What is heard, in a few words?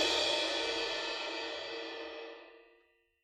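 suspended cymbal roll ff release; Idiophones; Struck Idiophones; Suspended Cymbal 1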